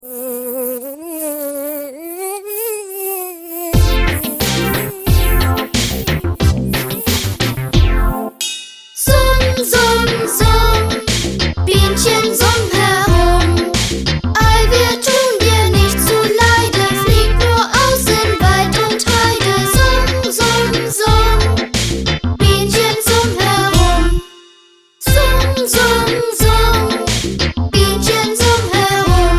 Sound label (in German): Summ summ summ